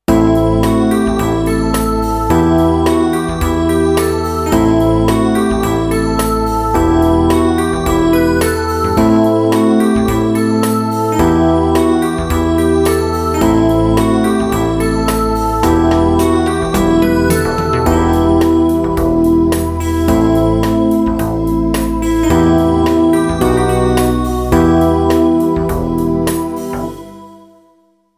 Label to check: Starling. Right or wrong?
Wrong